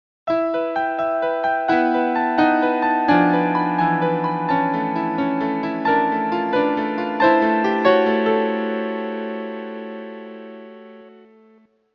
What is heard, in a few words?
MIDI